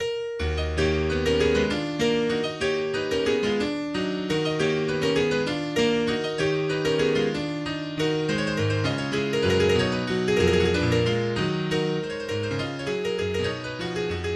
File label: in E Flat Minor Presto ma non troppo; Mazurka No 4; Opus 6 No4